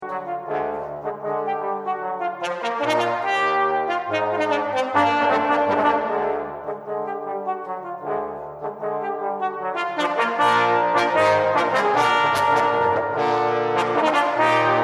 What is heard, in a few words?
Trombone Quartet